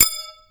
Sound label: ting TING